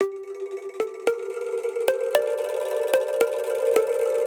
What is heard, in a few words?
Hair Clip Melody G MInor 112 bpm; MIDI Clips; Tonal; Ableton; Factory Packs; Lost and Found